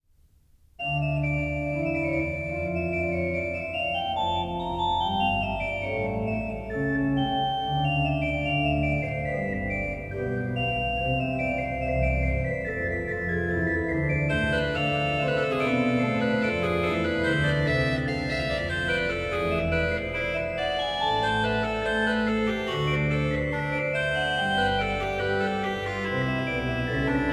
Trio Sonata No. 3 in D minor - I. Andante, Great Organ; BWV527; Bach; Bach Great Organ Works; Johann Sebastian Bach